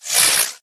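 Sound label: Drone Movement Turn; Titanfall 2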